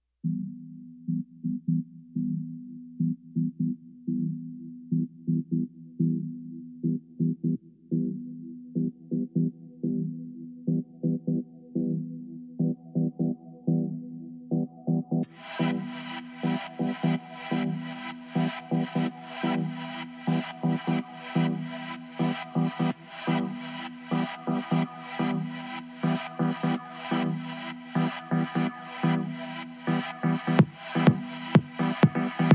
Glockenspiel

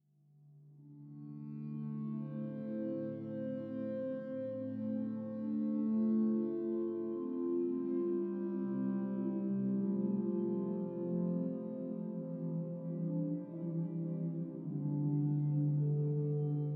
Relaxing Music

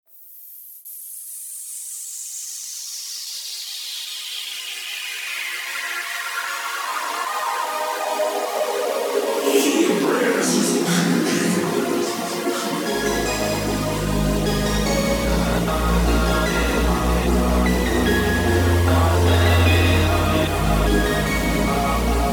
Brass

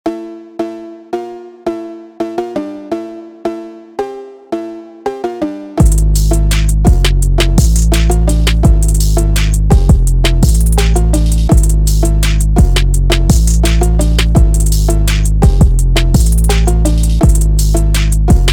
PHONK

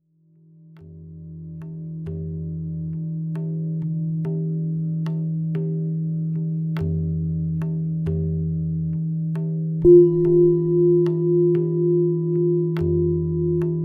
Cat Music; Dog Music

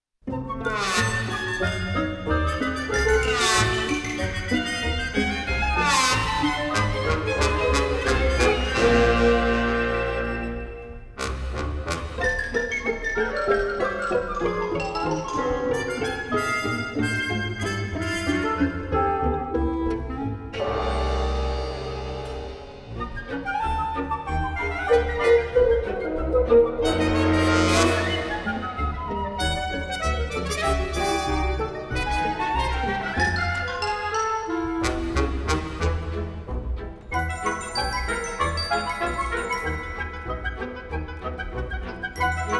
Ragtime, Ensemble; Roma Sinfonietta

